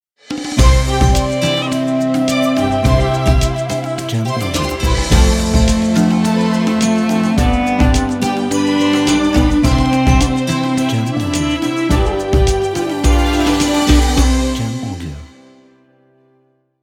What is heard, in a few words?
Arabian Intro Logo